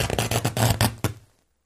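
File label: Electric Arc Short Circuit; arcs & sparks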